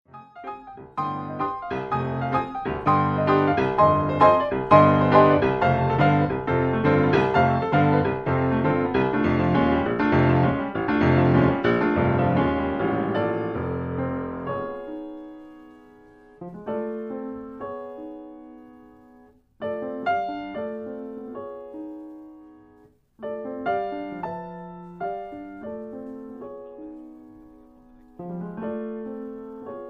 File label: blues; copland